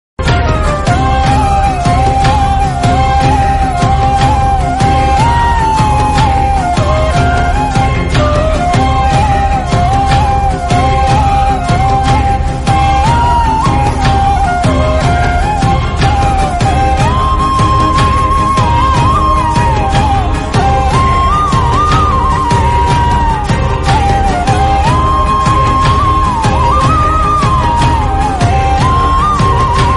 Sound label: love instrumental flute; ethir neechal